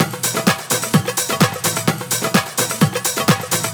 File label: Latin House Loop 128 BPM; Vengeance Samples